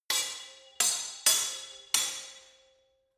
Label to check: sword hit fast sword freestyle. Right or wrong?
Right